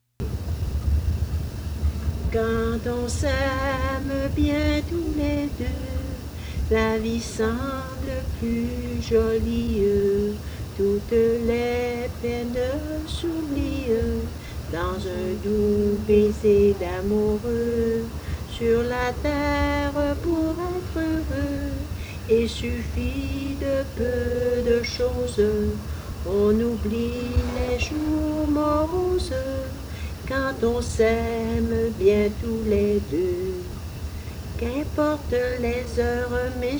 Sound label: singing